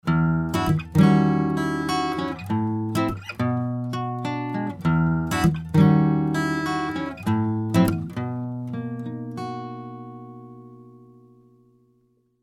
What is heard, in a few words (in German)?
Shelford Channel Western Gitarre Silk Blue; Rupert Neve Designs